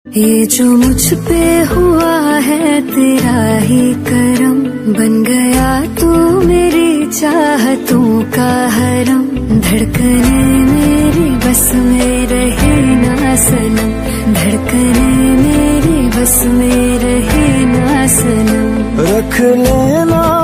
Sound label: Romantic Hindi Song Ringtone